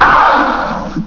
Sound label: AAAWWWWW